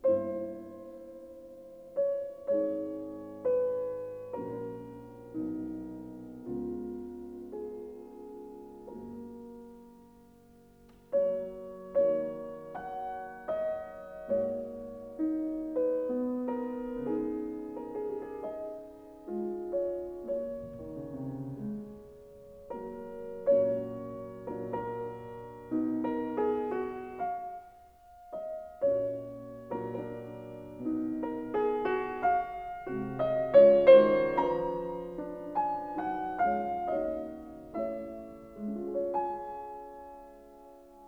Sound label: Sonata for Piano in B minor, Andante Sostenuto; Debut Recital